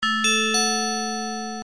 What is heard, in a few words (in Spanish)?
bell